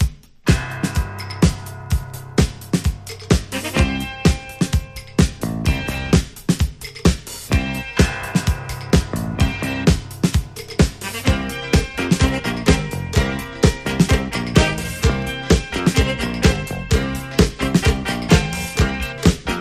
FUNKY INSTRUMENTAL

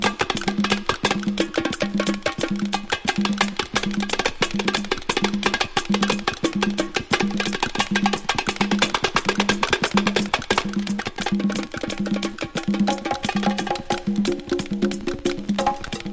super latin percussion; LATIN JAZZ

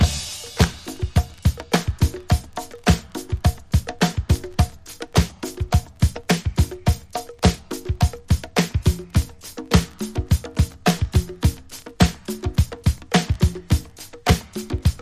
drum break; 70'S SOUL

